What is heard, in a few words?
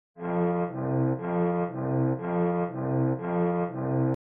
trumpet